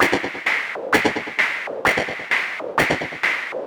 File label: Modular 130BPM